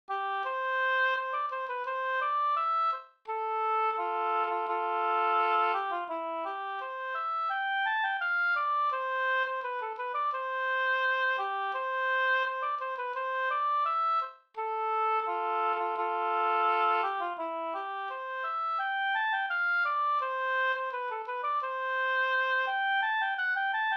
brudmarsch Delsbo brudmarsch

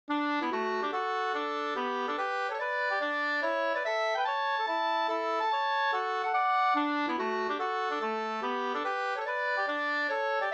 reinlender; fr Skillingmark

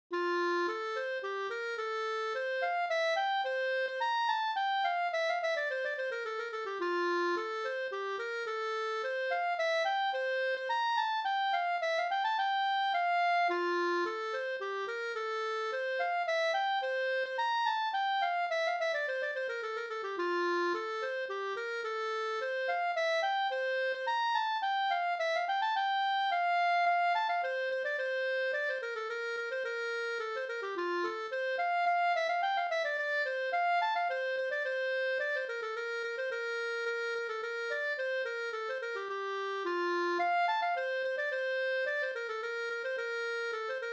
slängpolska

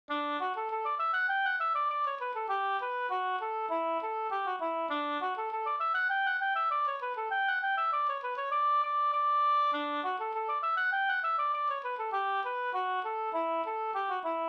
engelska

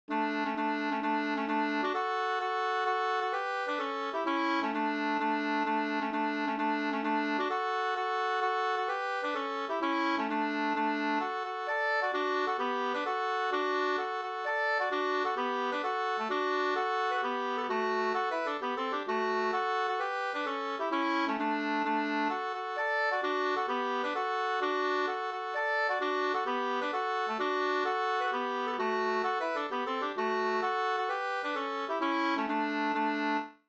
hambopolska; fr Norrland; Ahlstrom095

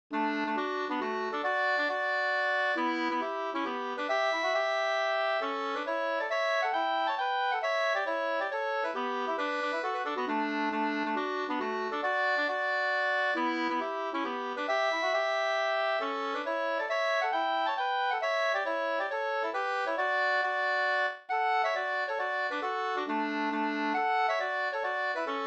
polska

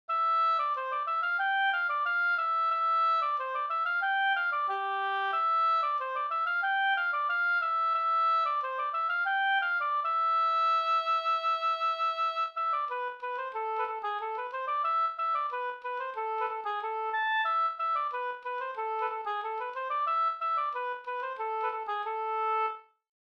halling

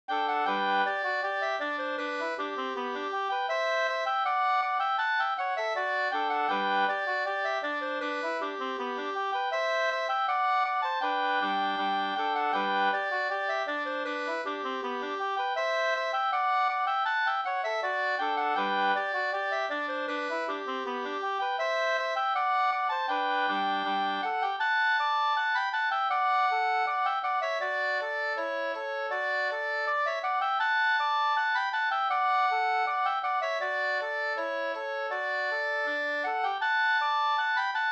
folkdans, kadrilj; Skraddarkadrilj Go 2st

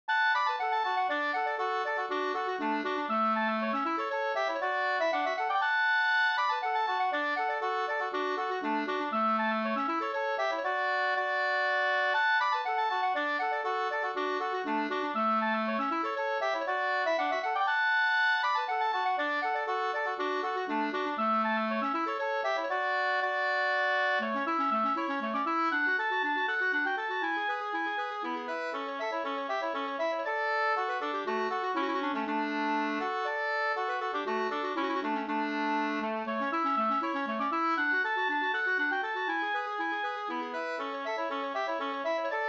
polska Bingsjopolska; Indianen e Pekkos Helmer 2st

